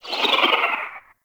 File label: Mind Control Buff End C; Warframe; Nyx Updated Sounds